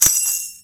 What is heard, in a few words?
Tamboreen; StecMetronome